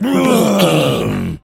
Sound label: zombie voice grunt